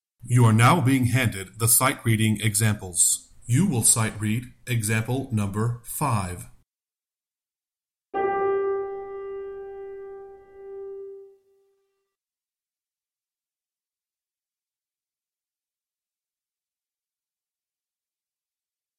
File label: Sightreading Example Soprano